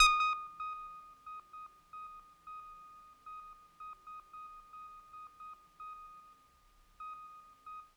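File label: Kawaii D#5; keys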